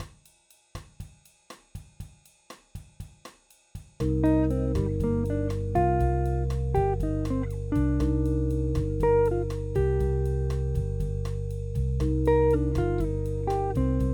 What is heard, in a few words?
triad exercise